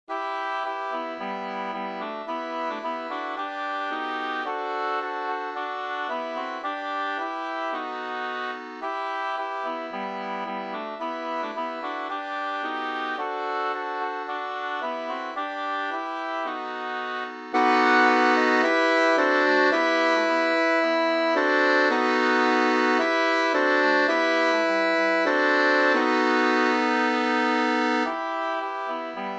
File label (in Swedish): vismelodi Folkvisa